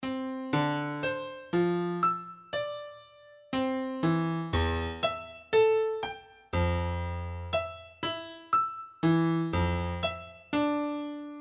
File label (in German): Mysteriöse Melodie